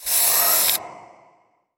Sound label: spray